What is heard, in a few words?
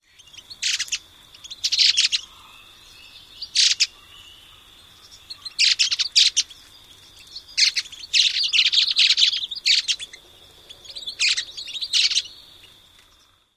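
BROWN-HEADED NUTHATCH calls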